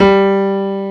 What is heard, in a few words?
piano, Gravis; PNOPATCH; GPPIANO